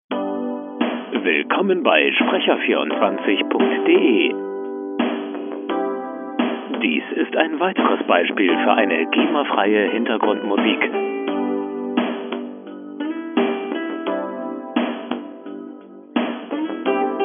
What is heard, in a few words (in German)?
musik